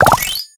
board advance space

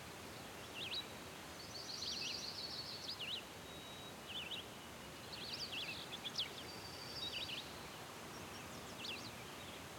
ambi advent

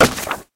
click collect

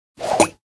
shop refresh

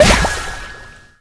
hit

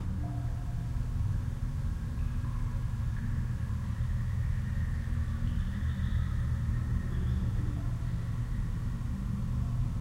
ambi upgrade

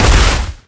hit

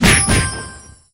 hit

